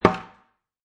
Bucket Plastic Placed Down, Foley, Bucket, Plastic, Empty, Large, Placed Down On Concrete; Set Down